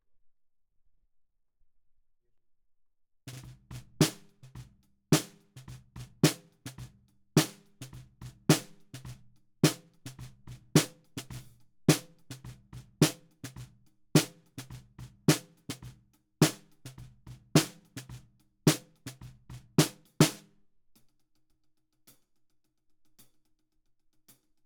Snare Bottom

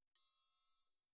TRIANGLE CLOSE

